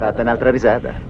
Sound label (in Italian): risata